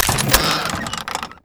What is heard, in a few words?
aid box open